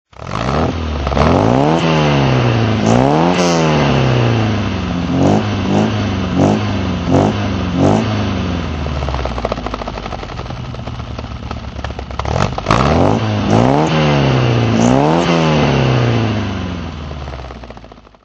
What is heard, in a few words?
volvo 123 gt throttle